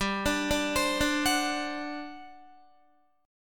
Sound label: G Major 7th Suspended 4th, G, down, Guitar; GM7sus4; arpeggiated